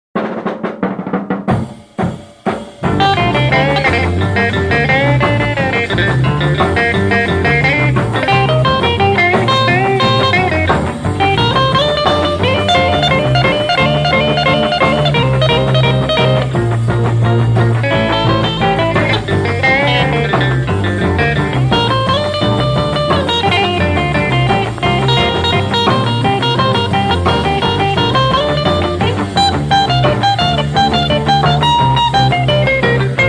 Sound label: Instrumental Version